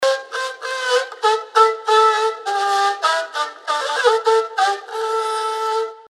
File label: Rebab riff 99bpm